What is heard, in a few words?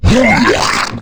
spit blob barf; pestilence